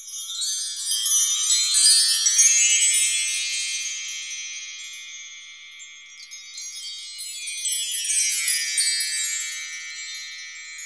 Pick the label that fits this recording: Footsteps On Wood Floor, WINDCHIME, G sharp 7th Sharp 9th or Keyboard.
WINDCHIME